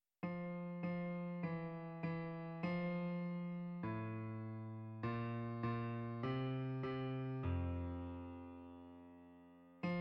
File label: Bass